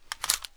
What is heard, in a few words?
ots33 mag in; garrysmod